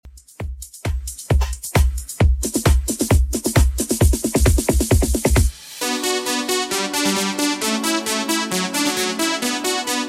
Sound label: 90s Redrum 133 bpm